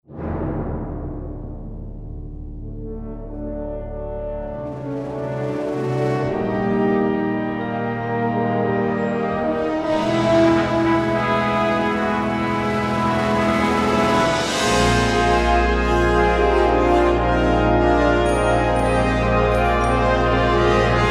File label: fanfare da festa